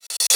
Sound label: Dr reverse